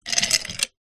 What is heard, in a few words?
food drink ice cubes in glass remove with hand